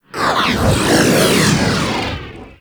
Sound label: Fighter Ship Attack Maneuver, Space Fighter Ship Engine; Warframe; RailJack; Enemy Ships; Corpus